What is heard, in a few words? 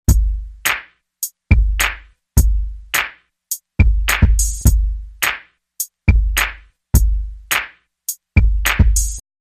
Drum Loop